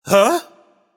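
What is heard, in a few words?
nonverbal surprised; smite; voicepack; merlin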